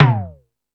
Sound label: Hip House, drum machine